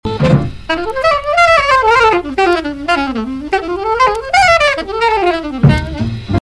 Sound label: Tunisia Break